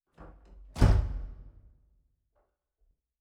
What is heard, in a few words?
door win